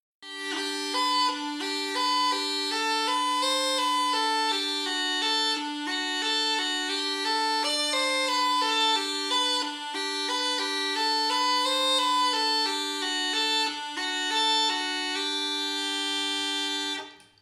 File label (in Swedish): Saeckpipa